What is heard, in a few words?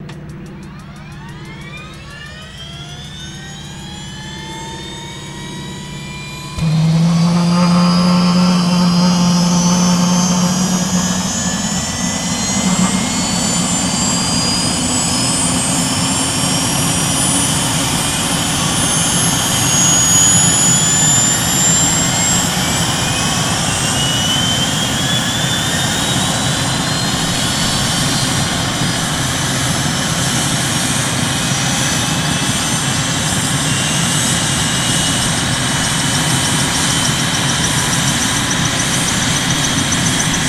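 Heli Start Idle, bell 212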